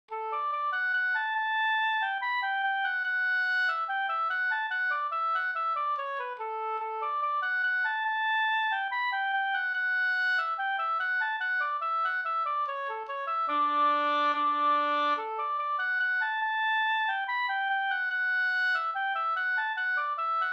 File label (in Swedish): skanklat